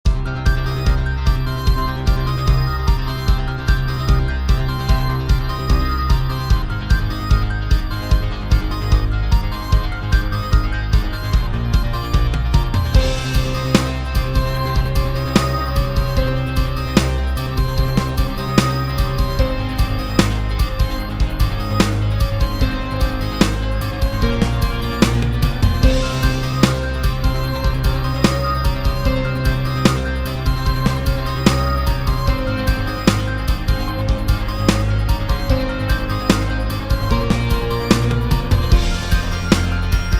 questioning (allegro)

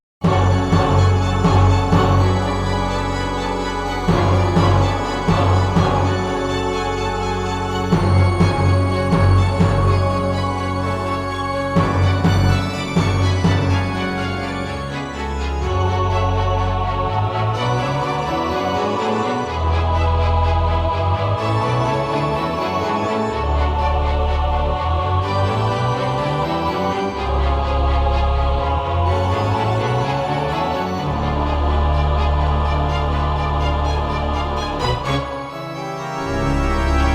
battle (suspense)

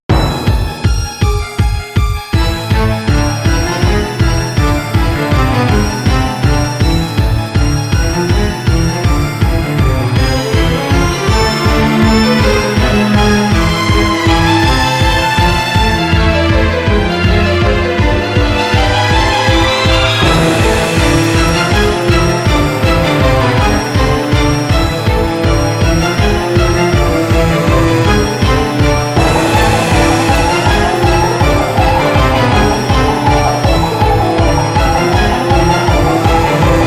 suspense, music

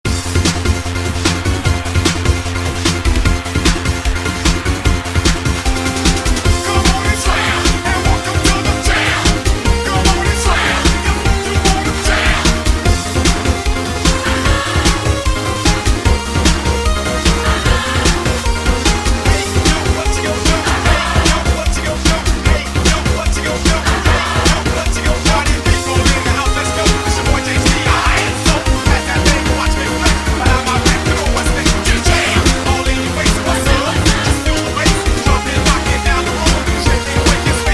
pursuit (slam)

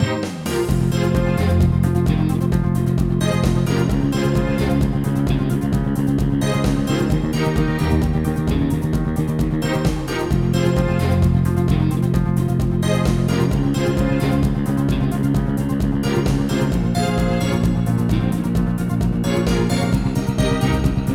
questioning (allegro)

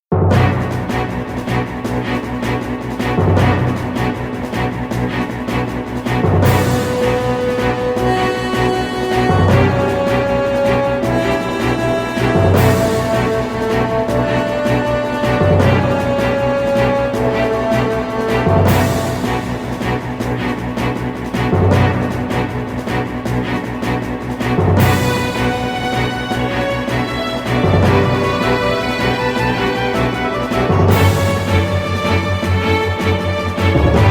questioning (allegro), music

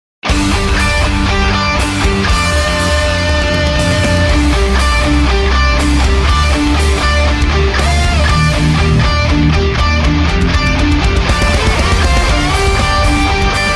objection (metal)

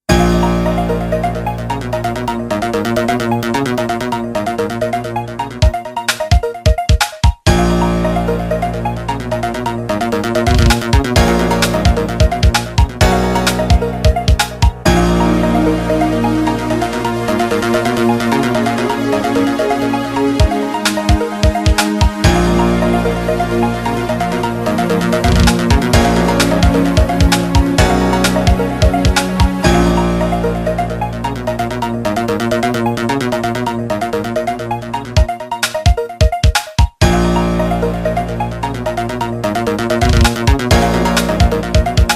questioning (moderato)

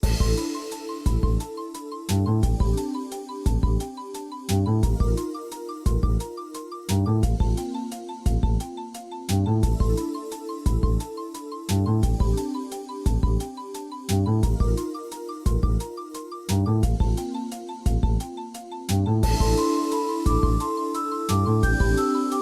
countdown

